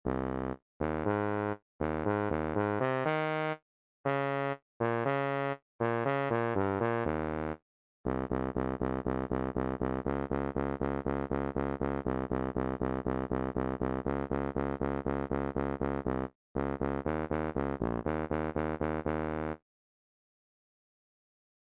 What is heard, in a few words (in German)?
Eine kleine Nachtmusik Tuba-Quartett Bass 3 Bb, Tuba Sousaphon